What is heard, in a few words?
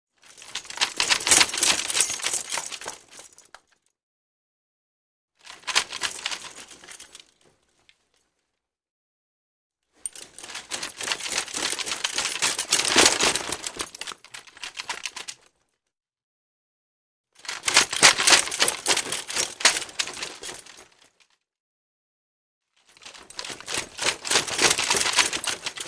Running, moving with weapons; steps